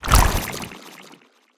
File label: atk bind